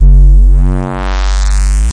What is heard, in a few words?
desecrated bass hit, evil bass sounds